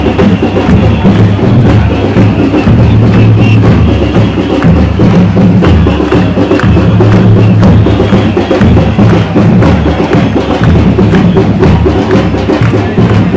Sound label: batucada